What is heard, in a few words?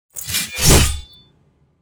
warrior close attack